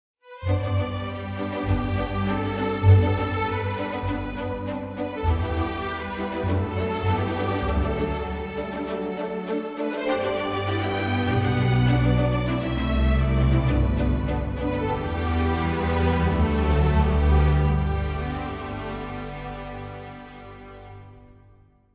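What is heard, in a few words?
3 layer strings rhythm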